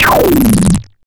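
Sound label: FX left, attacks; Best Service Advanced Media Trax Modern Composer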